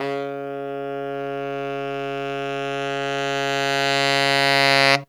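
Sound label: crescendo tenor; Zero-G; Phantom Horns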